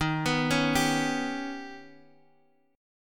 Eb Augmented 7th Eb down Guitar Half Step Down

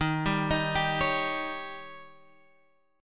D sharp 7th D sharp, Guitar Open G

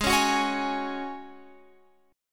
Ab 7th Suspended 4th Ab Guitar Open F; Ab7sus4 strummed

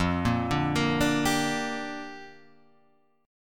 G Minor F, chord; Gm/F; arpeggiated; JGuitar

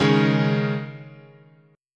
C sharp Suspended 2nd, guitar chord

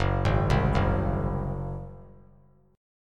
F sharp Suspended 2nd Flat 5th F sharp, Chord; arpeggiated; JGuitar

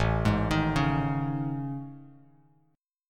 A Major Sharp 11th, Bass Standard; AM#11; arpeggiated; JGuitar

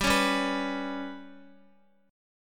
G Major Flat 5th G down Guitar 4F 4A 5C 5G 5C 6E; GMb5 strummed; JGuitar

